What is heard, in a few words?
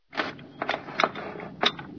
clank; ambient; Source Engine; cstrike